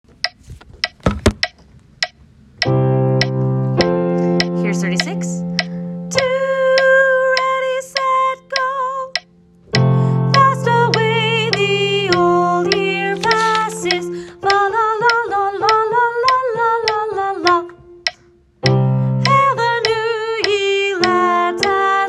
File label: soprano